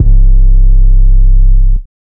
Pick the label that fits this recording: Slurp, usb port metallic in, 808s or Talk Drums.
808s